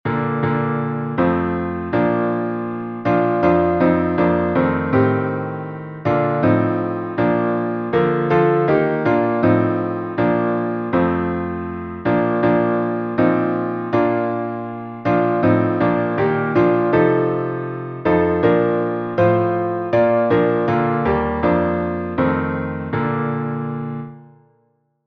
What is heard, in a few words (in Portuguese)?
instrumental; Salmo 119 9 16B